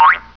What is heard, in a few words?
Cartoon Boing mono; ClipMedia